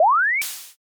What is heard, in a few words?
warp out